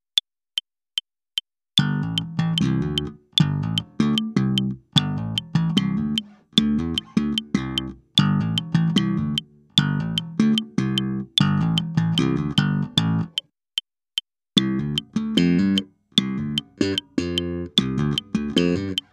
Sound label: Slap, bassoelettrico